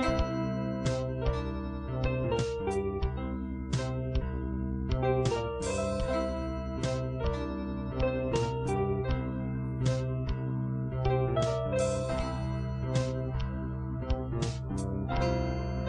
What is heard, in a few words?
beat